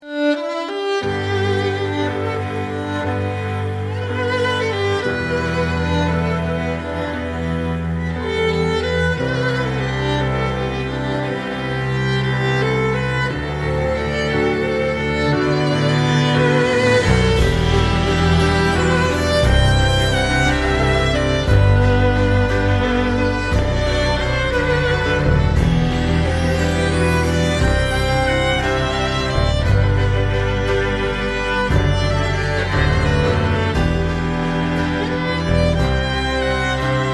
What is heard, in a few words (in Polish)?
cover kwartet smyczkowy